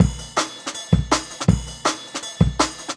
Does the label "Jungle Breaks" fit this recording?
Yes